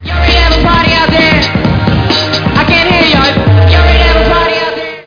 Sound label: Electronica Question